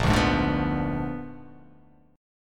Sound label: A sharp 7th Flat 9th A sharp down